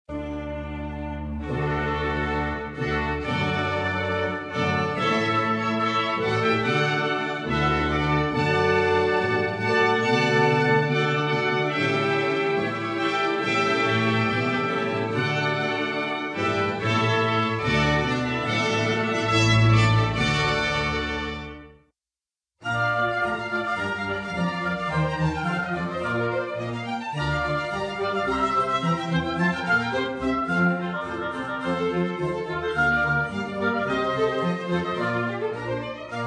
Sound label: string ensemble